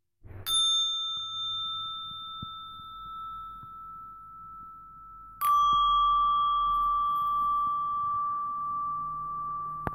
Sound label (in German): Langes Leben Klangschale